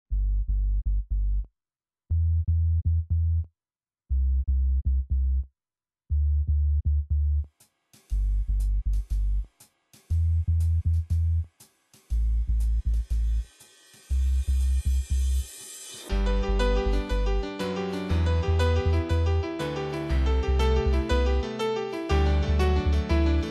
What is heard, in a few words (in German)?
Syntopianomelodie